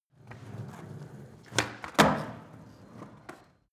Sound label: skateboard indoors jump; sport freizeit